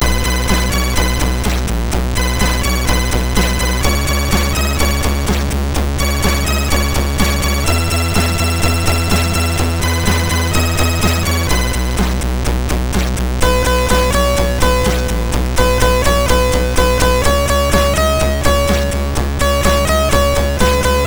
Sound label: Sound, MOS6581R3